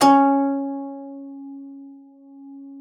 LAUTE C#4; Xsample VOL-8; LAUTE+FLG

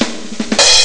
spanntrommel; ST-XX Amiga Soundtracker Sample Packs

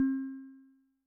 Glocken L, Yamaha DTXpress-II Instruments

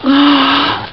stoehn; Amiga Soundtracker Sample Packs

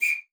TRILLER; Xsample VOL-9

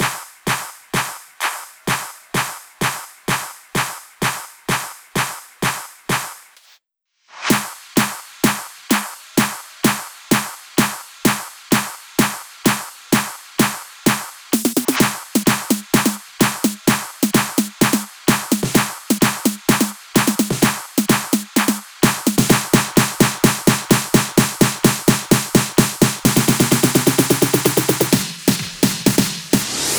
Drum Loop 128BPM FULL ohne BD

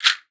RASSEL; Xsample VOL-9

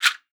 RASSEL; Xsample VOL-9

